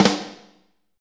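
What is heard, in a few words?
SNR snare, Medium Rock; Northstar; Drumscapes Roland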